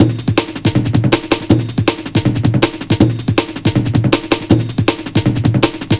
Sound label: 160, Drum Loops, Drum & Bass; Total Drum&Bass